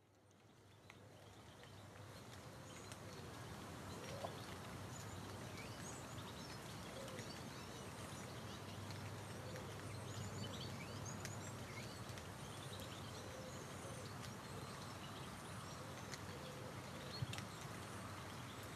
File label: York Walk Soundscape